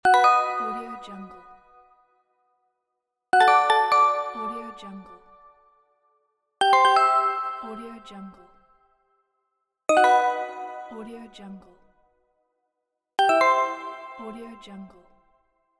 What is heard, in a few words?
correct answer